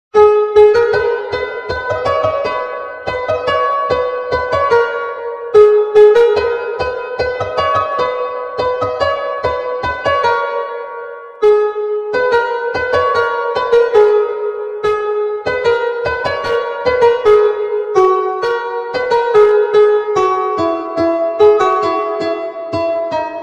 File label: islamic ringtone